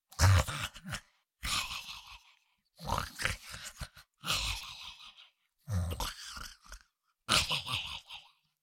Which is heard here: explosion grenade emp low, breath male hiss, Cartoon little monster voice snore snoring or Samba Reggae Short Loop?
Cartoon little monster voice snore snoring